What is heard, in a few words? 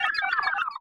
Beep-Falling; viewfinder